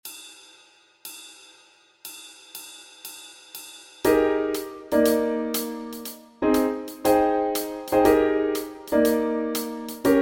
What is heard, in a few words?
Backing Track, walking bass